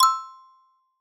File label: get money; Alpha-E-Corp